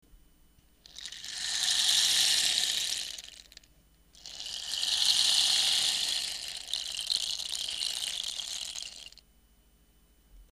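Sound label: rain stick; 爪楊枝を使った楽器の音です。; レインスティック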